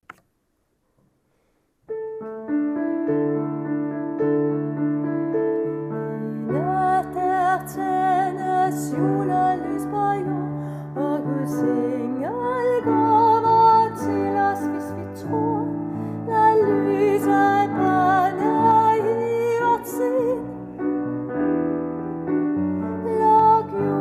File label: ten bas